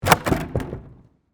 Gymnastics Jump, Sports, Gymnastics, Spring, Board, Jump; Miscellaneous Sport Sound Effects